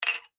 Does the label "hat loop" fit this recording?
No